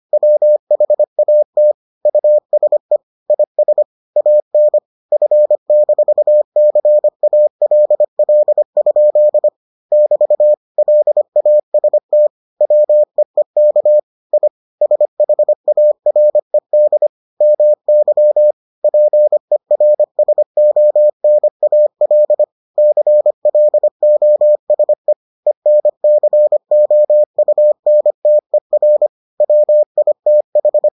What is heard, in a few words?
morse